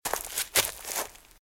Footsteps Leaves Shuffle; RadwinIsland

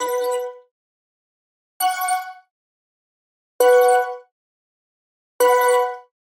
Digital Click Neutral

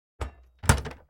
Door Close; blindside

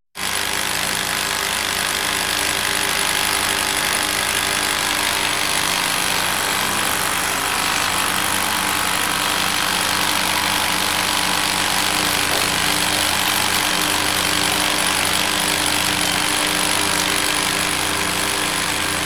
Drill; KMP Film Video Sound Effects

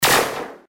lasershot; Code7

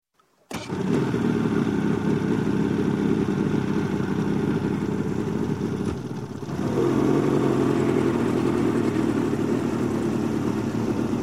Boat Outboard, 150 Hp - Start, Pull Away From; Dock; Sound Ideas; Series 2000

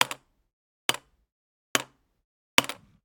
Generic Click Metal Small; boom library; The Interface

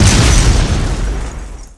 Explo Medium Turret; Destruction

